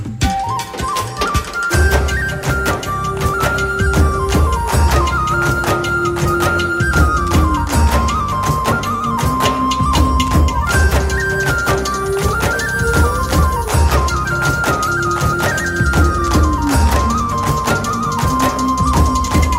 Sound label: BG Flute Tone